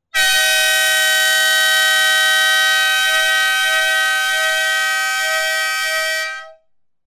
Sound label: train horn samples